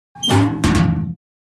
Metal beer keg drag and scrape

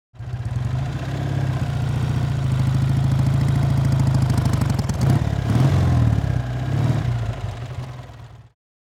Motorcycle driving decelerating stopping; vehicle

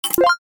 Slot machine credit insert game play

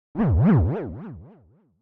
Cartoon accent impact boing

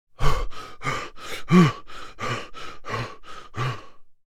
Male excitement moan in pleasure

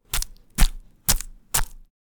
Knife or dagger slide and stab

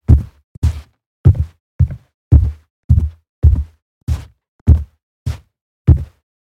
Footsteps on carpet boots walk with scuffs

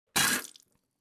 Mouth spitting out blood

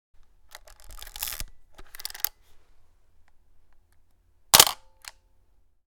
Analog camera shutter click long exposure

